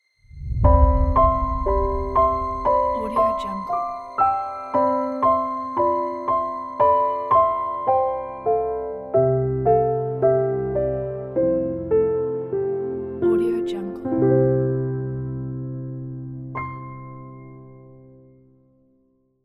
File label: romantic piano logo; nsound